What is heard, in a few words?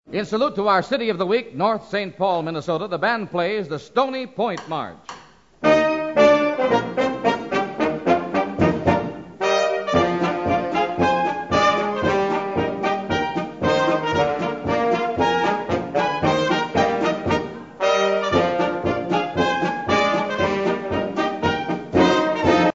march